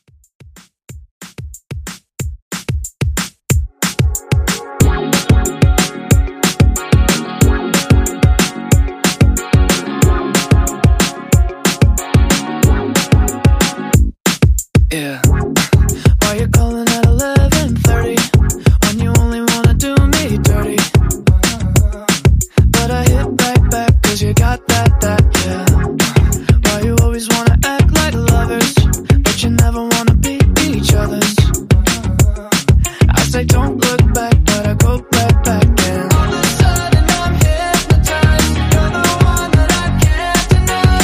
Top40 Redrum 184 Bpm

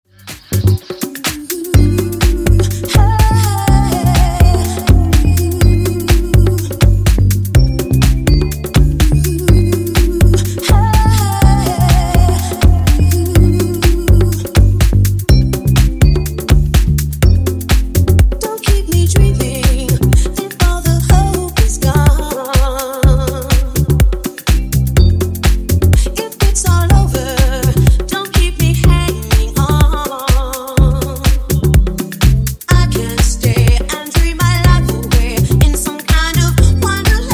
Funk Nudisco Remix 124bpm

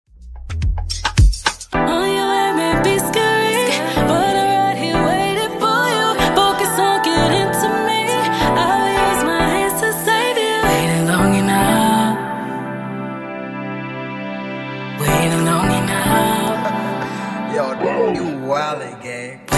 Dj Intro Outro Dirty 108Bpm

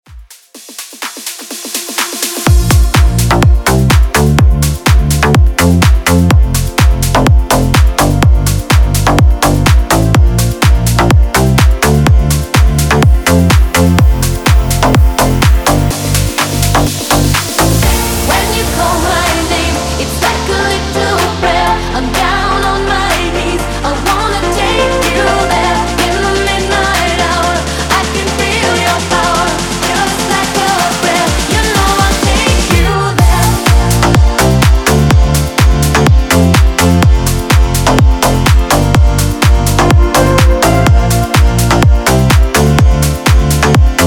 future house breaker 125 bpm; Redrum; remixplanet

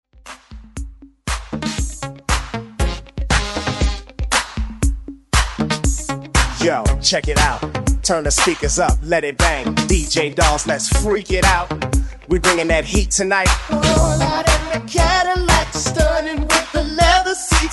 80s Re Drum Remix 118 Bpm; DJDOLLS

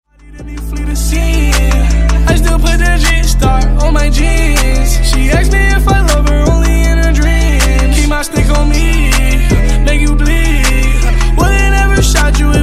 Intro Outro 79 Bpm

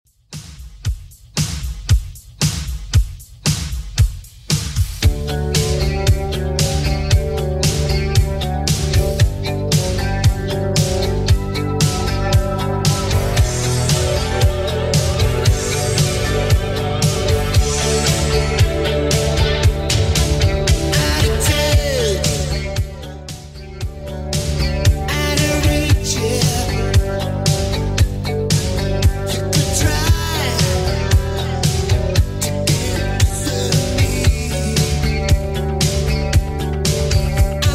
80s Redrum 115bpm

